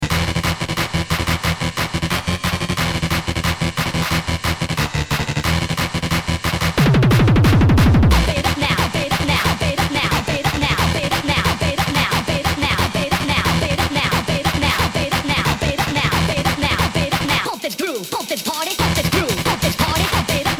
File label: hardcore gabber